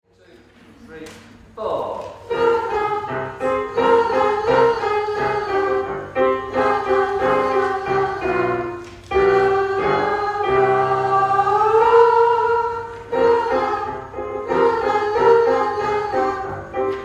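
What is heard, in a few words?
Dance me soprano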